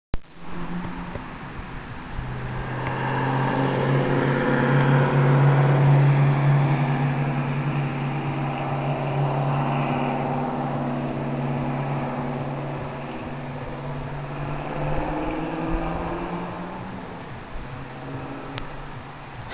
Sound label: Driveby, 2000 Chevy Camaro Z28